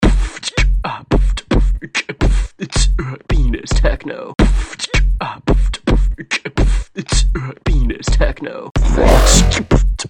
techno

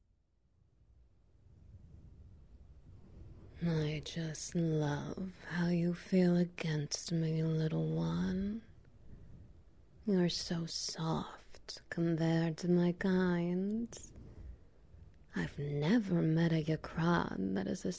Petting, Wet Noises; Giant Alien Cuddlefuck

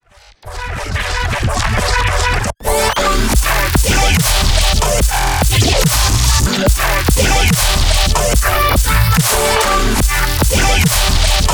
stream sound design

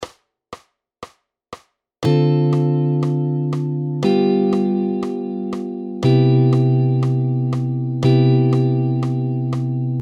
2.5.1 Progression in C

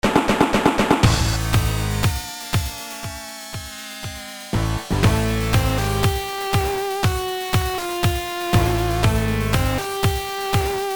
Sega Genesis Instrumental Remix; Spider-Man TAS Theme; SupremeMIDI

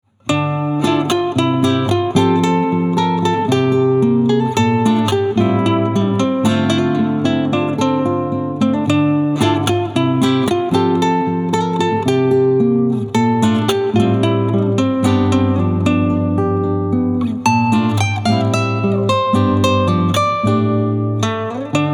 Unplugged; Spira; Final Fantasy X

